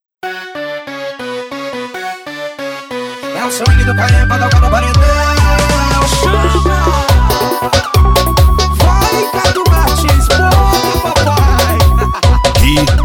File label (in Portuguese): ritmo lento; cd gui auto som vol 02